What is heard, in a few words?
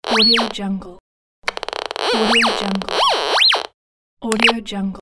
metal detector